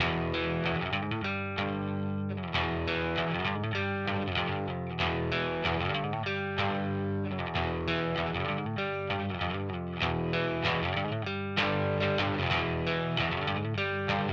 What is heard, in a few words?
Simplifier DLX Parallel American MS Brit EL34 Clean Crunch